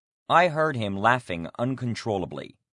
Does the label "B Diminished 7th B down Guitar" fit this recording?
No